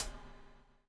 BUBL, Backbeat; Spectrasonics - Backbeat

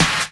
Dance Drums

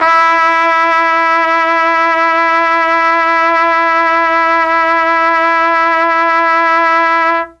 E2 trumpet, Mellotron; CHMB TRUMPET; Mike Pinder - Mellotron Archive CD

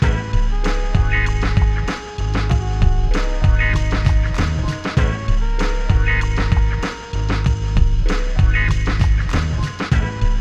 97 BPM D, Hip Hop; InVision Interactive; 40 Oz Phat Slammin

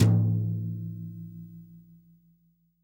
TOM, Real Drum Kit, SWING KIT; Best Service ProSamples vol.43 - Real Drum Kits

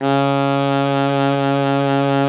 C#3, SAXOPHONE; Session Instruments; Best Service ProSamples vol.42

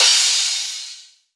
Crash, Drums Cymbal

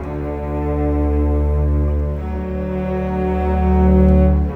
Bass Cello, Cinematic

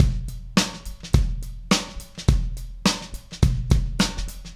DH Beat, Indie Rock Anthems; Sonic Foundry (Sony Creative Software)

